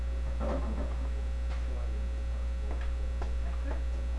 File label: coin